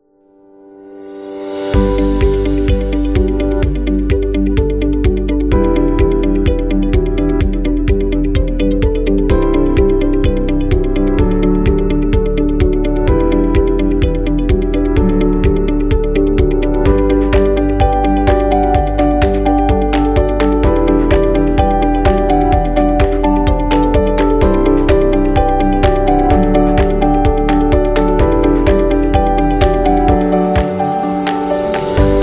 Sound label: Cinematic Corporate; corporate music vol 7